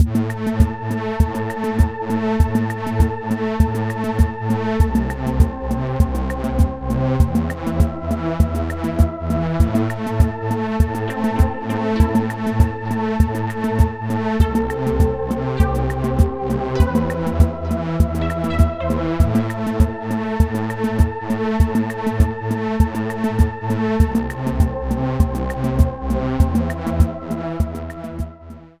nitron

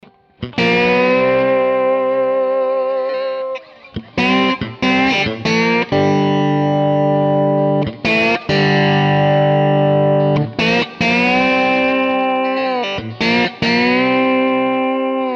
59M NOS Both PUs Bottleneck; Danelectro

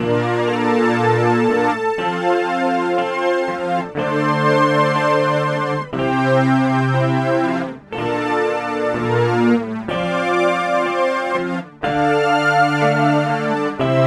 Boss SY 1 Strings

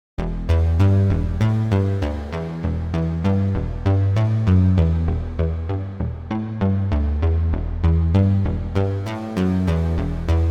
Eventide SP2016 ST Room Modern

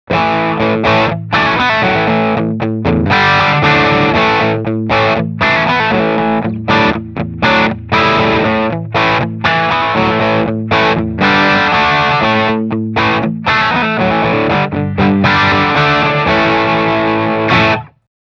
Low Gain Medium Tone Rhythm

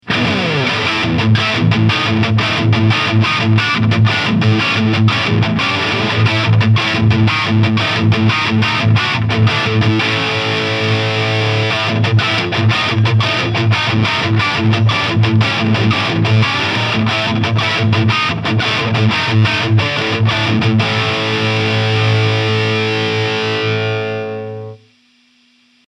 Solar Guitars E1.6 Lead; Vinter Perl White Matte

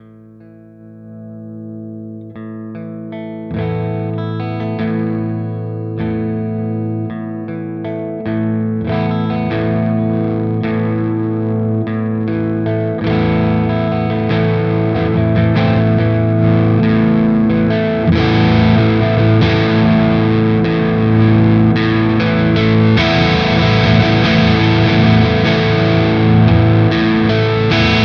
Harley Benton Volume poti

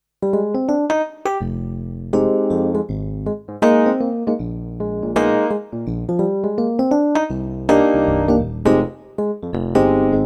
Elec Piano

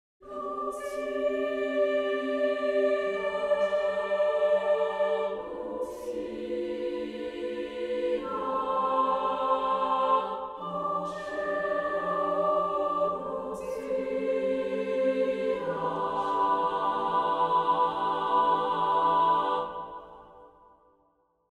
Syllable Preset; Strezov Sampling Freyja

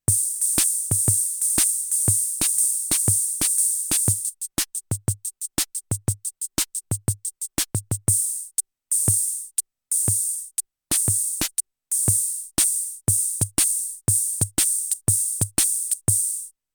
Roland TR 8S CR 78S TRIPLETS

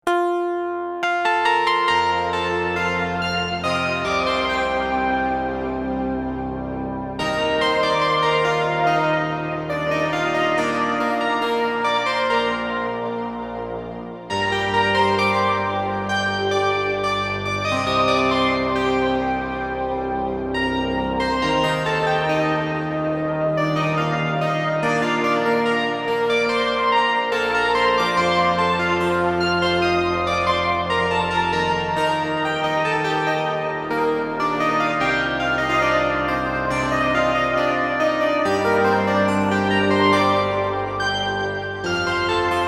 UDO Super 6 Melancholic Piano

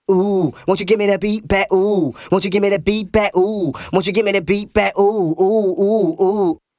Cameleon Labs 7721 Vocal comp

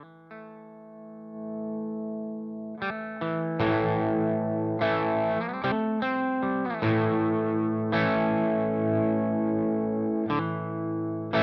Crazy Tube Circuits Space Charged V2 Volume Poti

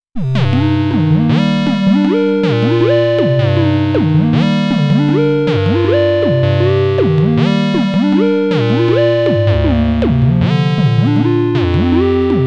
Dreadbox Polyend Medusa Sinus Melody

